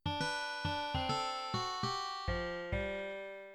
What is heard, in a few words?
Country Guitar